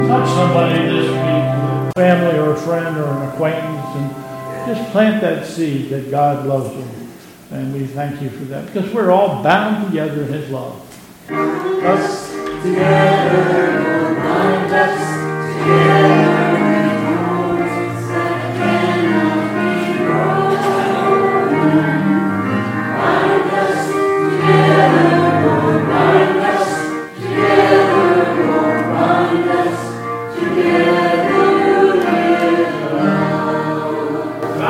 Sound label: Closing Chorus